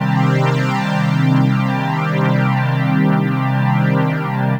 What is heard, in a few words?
Sonic Pad - 5A; Jungle; Pads; Blu Mar Ten; Jungle - 1989 to 1999